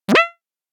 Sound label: get outta here, message tones